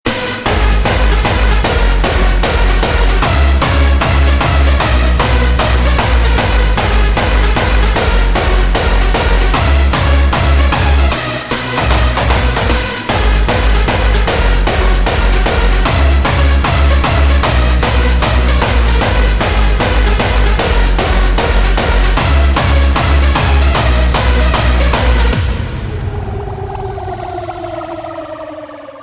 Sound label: Reload